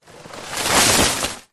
household large green waste garden bag full plants foliage set down